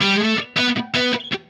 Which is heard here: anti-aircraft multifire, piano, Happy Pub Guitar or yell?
Happy Pub Guitar